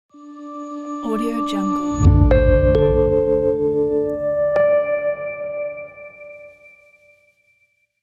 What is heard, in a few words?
elegant minimal logo